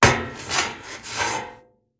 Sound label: Metal Turn